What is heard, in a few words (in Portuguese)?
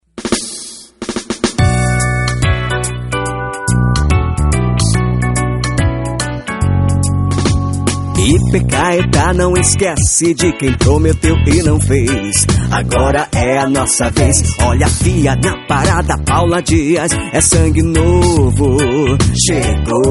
Jingle politico